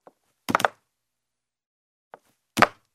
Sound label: Jump on the wooden floor in heels; steps